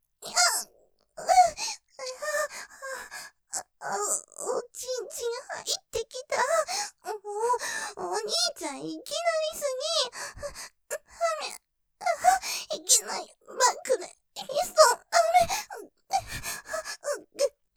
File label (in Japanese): 喘ぎ いきなりとろとろ; おちんちん入ってる！いきなりピストン; ステレオ; ループで楽しむ！シェフの気まぐれ抜き抜きコース